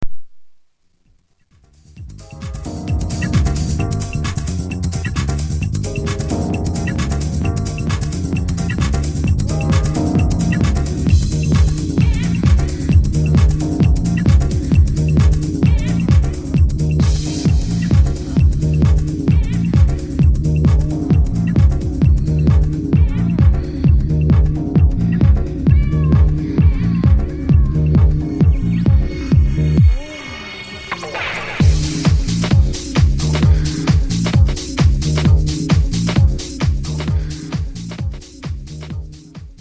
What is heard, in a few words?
Mixing Show House